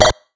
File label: blip; videogamebundle; soundscrate